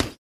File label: Boxing Bag Hit Shrt